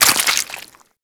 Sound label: Proc Bleeding; Warframe; Mods